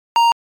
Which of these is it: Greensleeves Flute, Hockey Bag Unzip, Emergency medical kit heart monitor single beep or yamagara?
Emergency medical kit heart monitor single beep